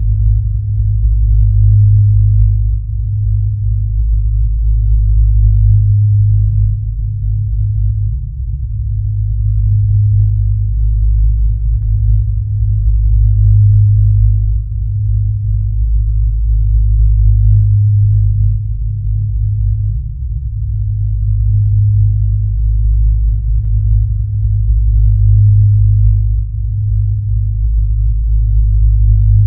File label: Ambiance